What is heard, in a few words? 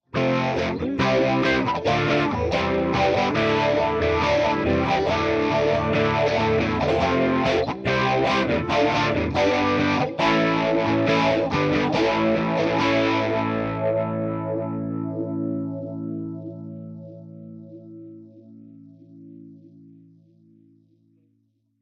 mxr srlp chords vintage; ampsims